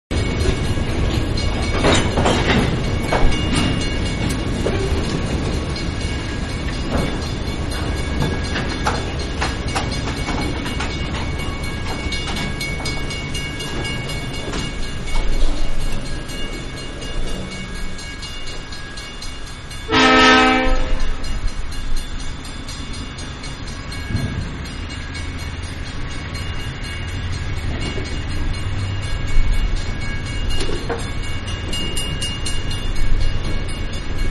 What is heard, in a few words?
Train Crossing At A Road, Freight; Trains (Sound Effects:Vehicles); Manhattan Production Music